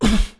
pain grunt

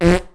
Whoopie cushion fart

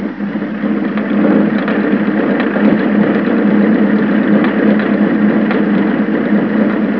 idle, motorcycle

